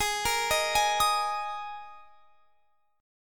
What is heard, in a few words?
Ab Suspended 2nd; arpeggiated; Absus2; x,23,20,20,21,23